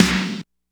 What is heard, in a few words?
DR660 DANCE, DRUMS PERC; Rubber Chicken Software Sample Bank